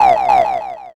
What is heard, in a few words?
crow